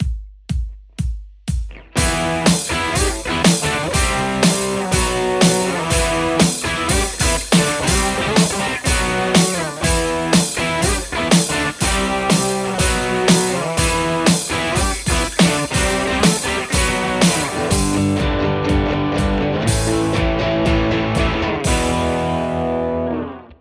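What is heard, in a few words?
Telecaster JCM800 hot; mercuriall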